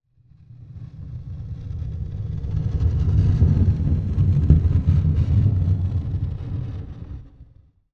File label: foley heavy item drag along hard floor